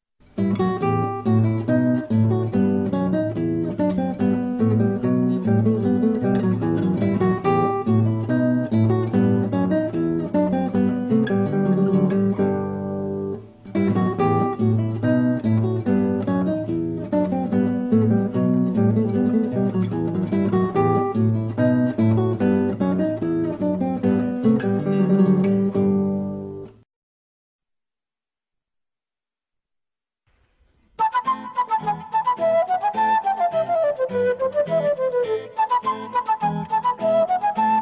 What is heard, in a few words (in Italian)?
bourrée, liuto; bourrée in mi minore; nella versione per liuto e in una trascrizione moderna; J. S. Bach